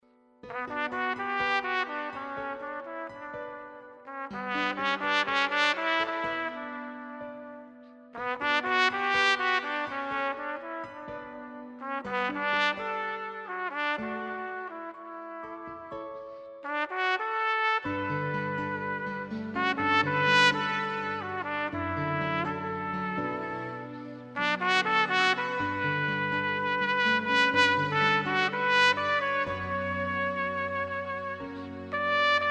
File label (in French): trompette